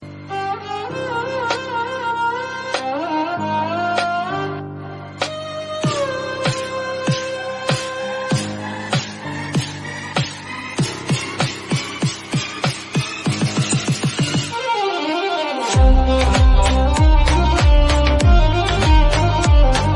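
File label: Tamil Background Music Ringtone; Vikram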